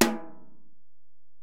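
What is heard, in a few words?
Acoustic Snare, Alesis DM-PRO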